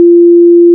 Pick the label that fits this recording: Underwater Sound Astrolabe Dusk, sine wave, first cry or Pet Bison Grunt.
sine wave